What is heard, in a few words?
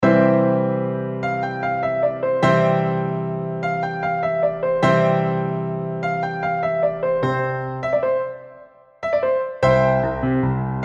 solo, piano; Hridayam